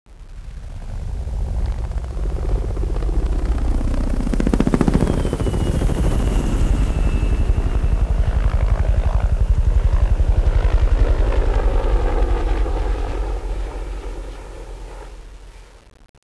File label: copter flyby